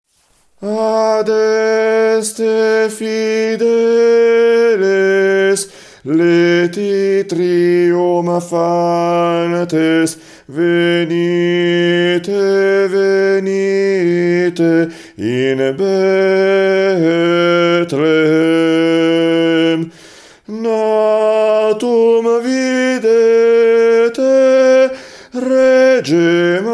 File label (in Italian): Adeste Fideles tenori